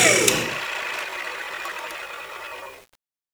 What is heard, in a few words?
hw spindown